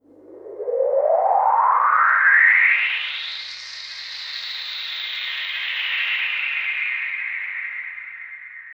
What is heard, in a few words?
Wind, Drum & Bass Pads; Total Drum&Bass; 90 s Sample CDs